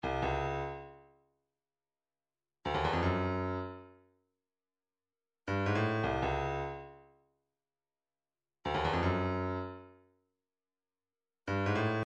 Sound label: 160 slap shot djembe